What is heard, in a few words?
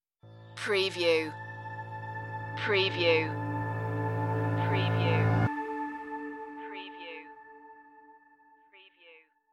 corporate sooth stinger